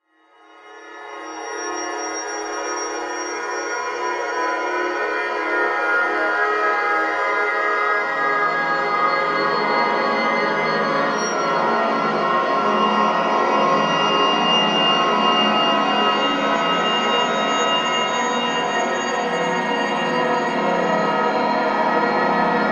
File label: horror scary ambience